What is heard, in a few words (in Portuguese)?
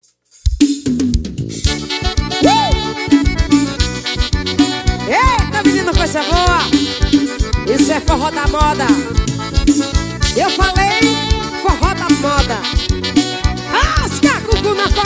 forro acordeon